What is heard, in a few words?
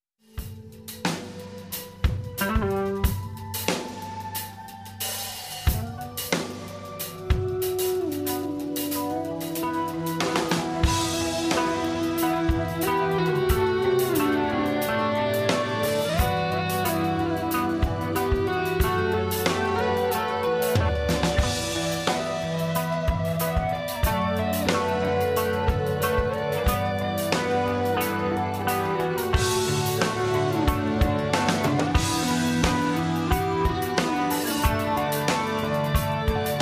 Guitar Solo